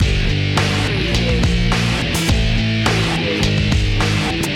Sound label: wind grunge loop